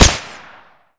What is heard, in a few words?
Deagle